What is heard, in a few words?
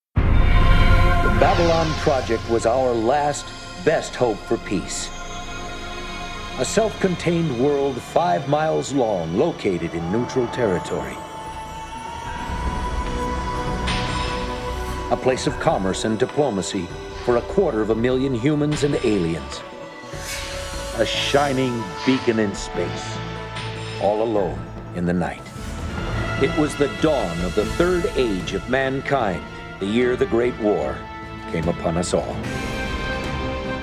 Main Titles with Narration